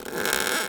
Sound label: Polystyrene Creak